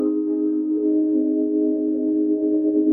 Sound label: notification